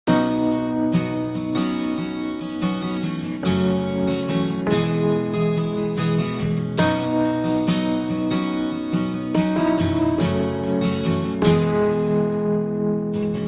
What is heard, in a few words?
country guitar; Music